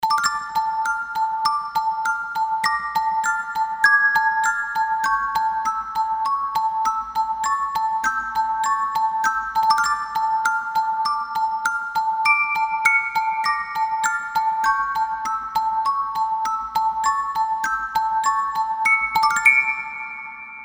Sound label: Glockenspiel